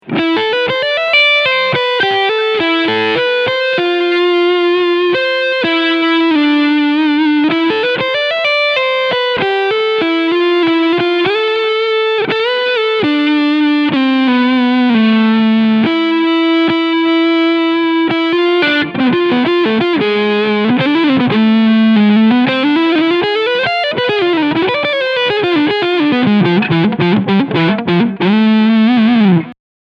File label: R 446 Lead Sound Neck PU; Harley Benton